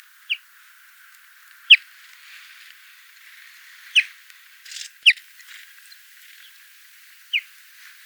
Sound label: call, chaffinch, flight call - SEX/AGE: unknown - COMMENT: Wind noise on background; ALTITUDE: 0 m.; fringilla coelebs